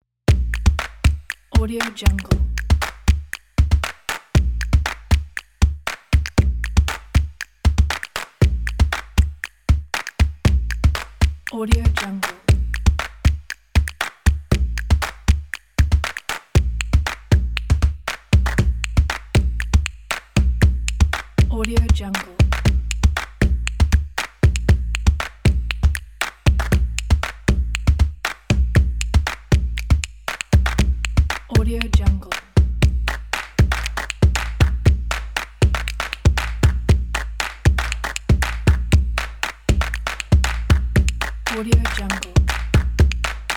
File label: percussion